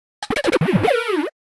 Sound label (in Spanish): Grito de Bibarel